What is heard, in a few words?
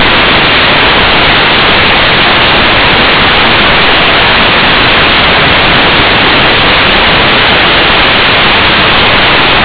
Wilhelm Scream